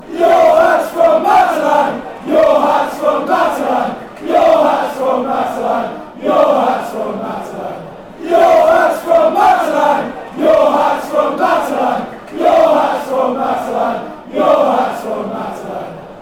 your hats matalan fanchants, Ipswich Town football songs; Forever and ever, sung regularly, another Ipswich football song